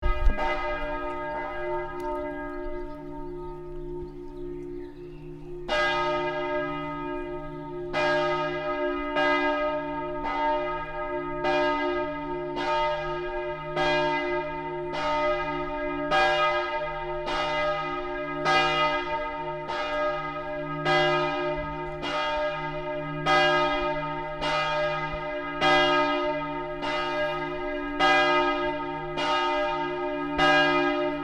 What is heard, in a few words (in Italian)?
campane sirena